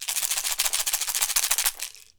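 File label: COW RATTLE, PERC; AKAI S6000 CD-ROM - WorldSounds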